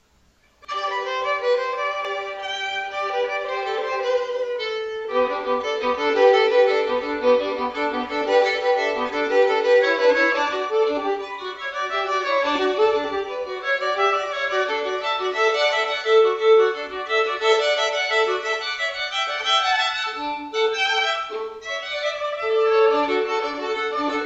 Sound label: duet; Teleman: Duet in G